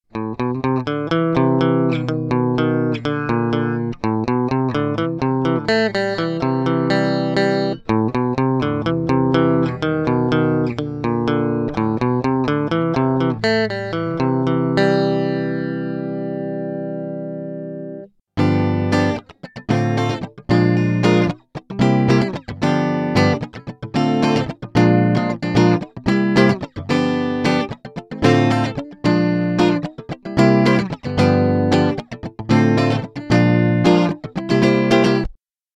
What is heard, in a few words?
Guitar - Dry